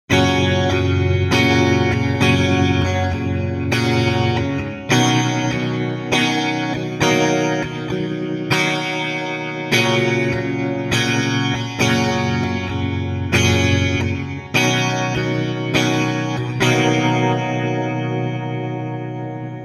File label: Tremolo Dry Wet Stereo; Virgo